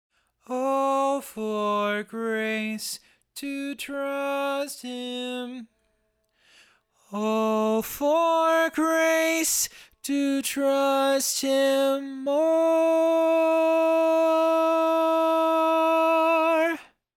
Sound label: Tenor, barbershop